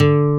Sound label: Nylon Guitar D2; Proteus 2000; Digital Sound Factory Proteus 2000